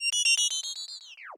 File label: space alarm